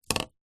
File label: food easter egg in foil wrapper down on surface; zapsplat